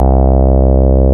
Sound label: rave beep C1; Best Service Alpha Dance vol.2